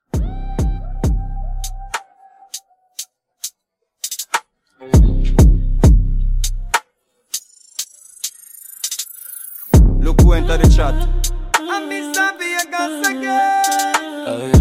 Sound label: Club 100